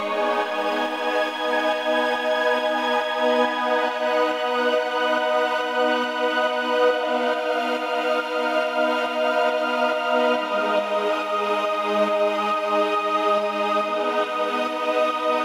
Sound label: 139BPM Choir pad, G#minor; Melody & Synth